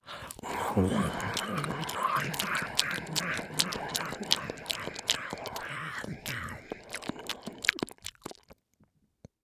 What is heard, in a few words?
Horror Scary Human Chew Monster Scary